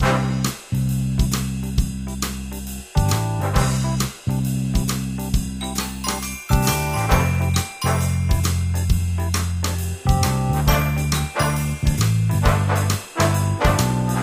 Music; Diner Dash 5 Boom

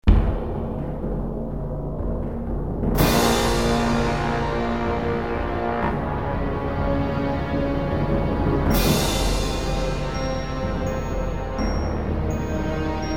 dragon attack; Gothic 2

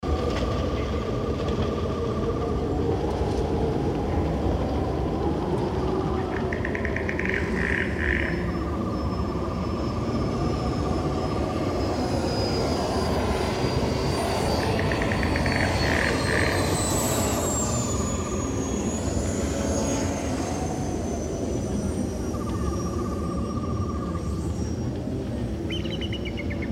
amb tatooine City

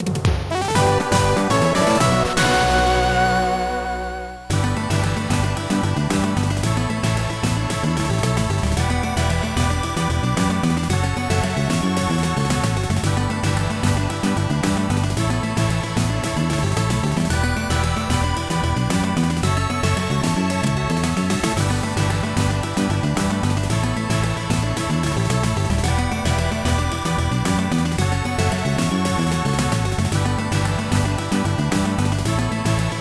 Victory - Battle; dungeons of aether; macos windows gamerip 2022

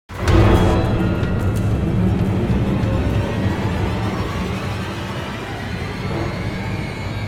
music Player Spotted; Stygian Reign of the Old Ones

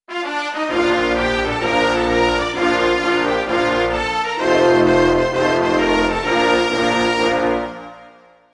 Anthem Belgium; Buzz! The Mega Quiz; PS2

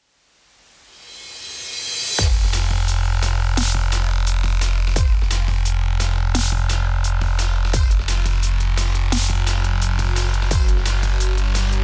Soft Neuro; Cyber Hook

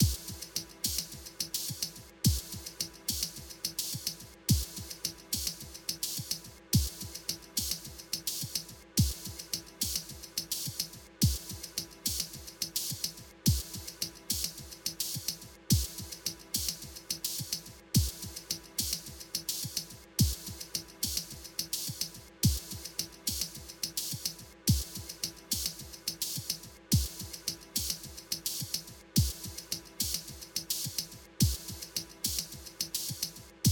Tension Curve; nova 111; ps vita

